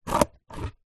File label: office scissors cut cardboard